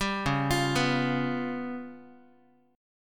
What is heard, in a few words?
Db 7th Flat 5th, Guitar; 10,9,8,9,x,x; Guitar-4A,4E,5A,5D,5Gsharp,6Csharp; Db7b5 arpeggiated